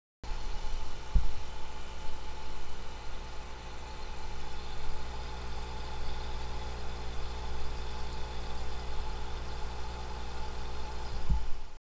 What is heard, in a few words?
air; water